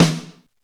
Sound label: snare, AKAI XE-8; Greytsounds